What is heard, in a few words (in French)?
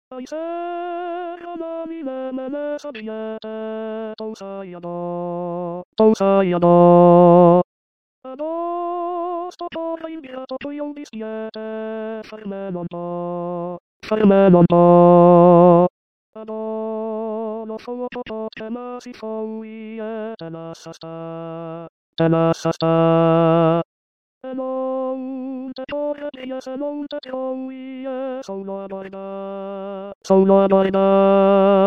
funiculi funicula tenor italien